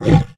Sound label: Demon hit; Blastmorph Pinball; xbox series xs